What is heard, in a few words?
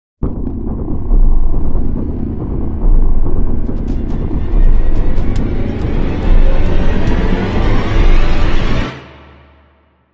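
Crime Sting, No Percussion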